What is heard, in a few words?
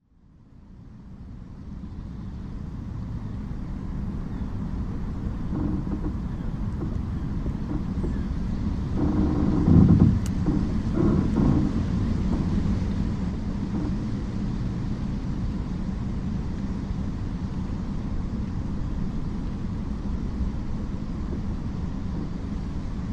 noise of the wind inside a tree